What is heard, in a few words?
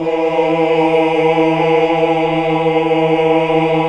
AAH UNI